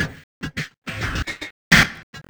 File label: Panning, Drums Beats